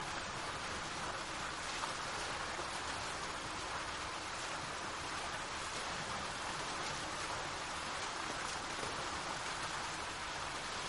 fuente

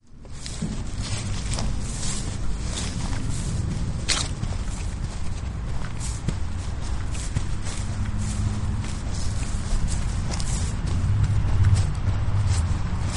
ambiente huellas grass ruido del trafico coches rodean la vida cotidiana sonidos

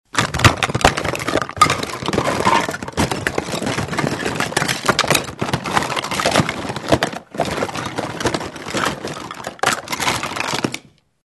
juguetes juguete

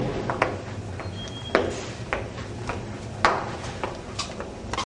caminar

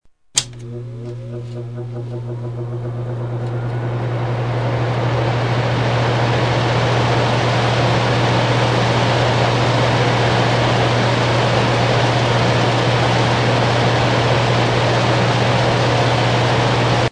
aire acondicionado

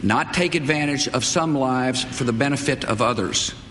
congreso

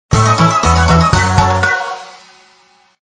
nivel completado; angry birds

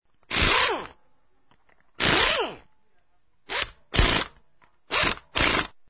Atornilladora atornilladora

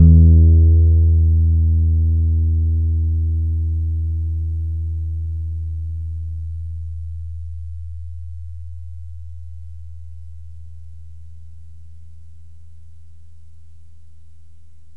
contrabajo

